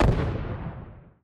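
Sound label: single distant explosion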